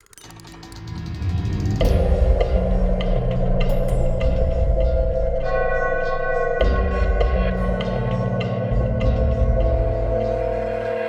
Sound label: String; Assassin's Creed Unity Dead Kings